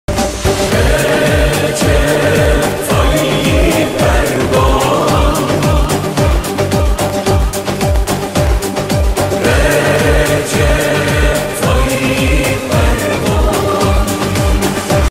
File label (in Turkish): dombra zil sesi; recep tayyip erdogan